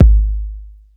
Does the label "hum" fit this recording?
No